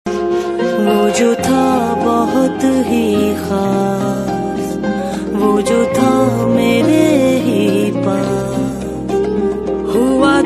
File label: pakistani ringtone